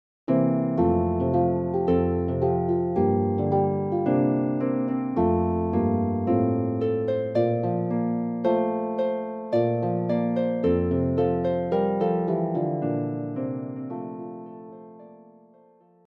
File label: Harp; Famous Music for the Harp Vol 6